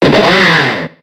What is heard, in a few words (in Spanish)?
Grito de Krokorok